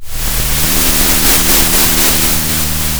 pop, Sound of a train

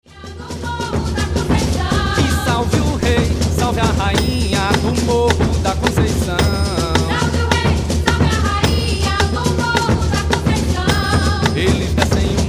maracatu

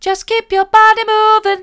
just keep your body moving mid, vocal

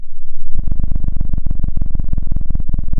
pop, Sound of a cat purring

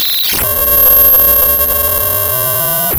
pop, Sound of a cat meowing

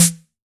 Snr, drum

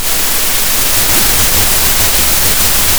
pop, Sound of a thunderstorm

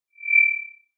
Sound of an owl

